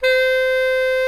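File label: Tenor Sax D; TSX TENR SAX; Giga Samples Collection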